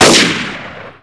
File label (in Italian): sparo, armi